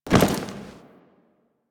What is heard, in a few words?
Tenno Land; Warframe; Lotus Intro